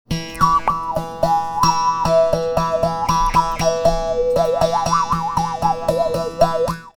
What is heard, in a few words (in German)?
Mundbogen